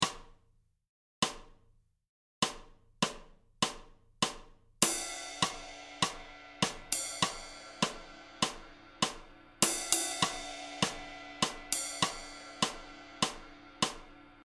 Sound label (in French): rythme, guitare jazz